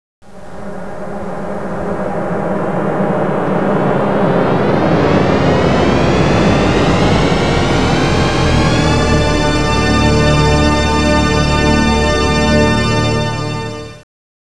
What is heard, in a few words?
dolby thx